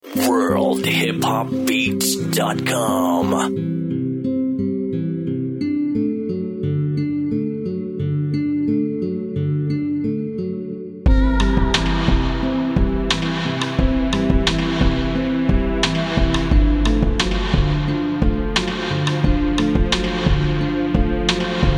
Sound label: Life 88bpm